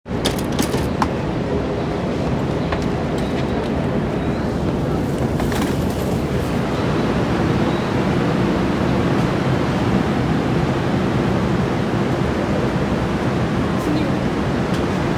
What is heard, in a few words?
train sounds; 西武池袋線小手指東